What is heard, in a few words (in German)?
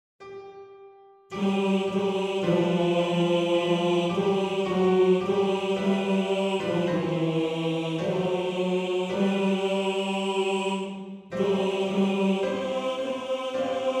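Bass, Männerchor; Bass 1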